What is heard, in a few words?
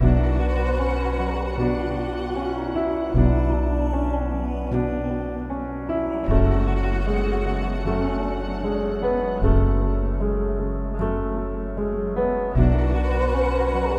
153 BPM F Min, Melodies; Eden; Cymatics; Immortal Production Suite Beta